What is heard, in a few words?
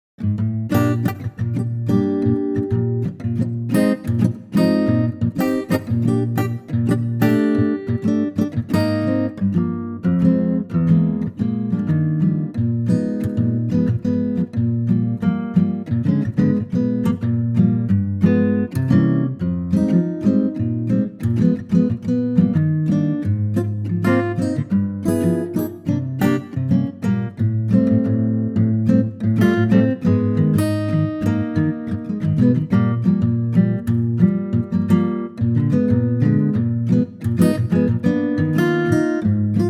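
Eb latin acoustic guitar; key - Eb - vocal range - Bb to D; Stunning acoustic guitar track, in a double tempo latin feel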